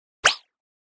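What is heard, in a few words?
Electric Drip Reverb, multimedia element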